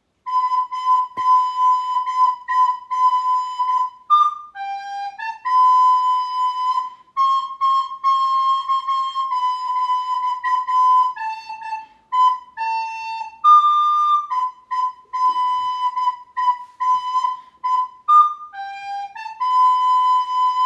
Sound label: Jingle Bells